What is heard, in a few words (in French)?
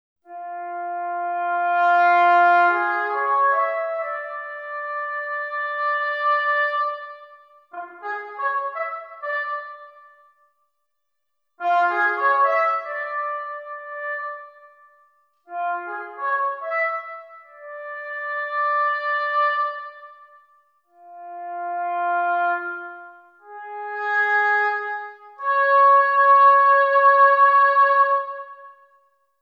haut; wallander instruments; wivi player